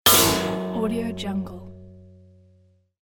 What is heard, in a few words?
reso metal impulse